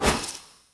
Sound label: shadow dodge; hero; mirage